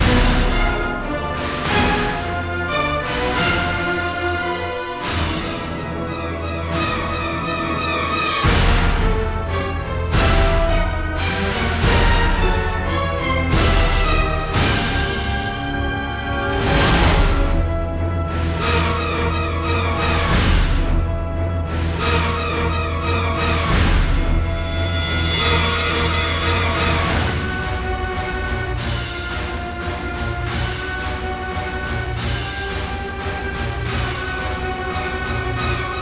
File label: Battle; Ninja Gaiden Dragon Sword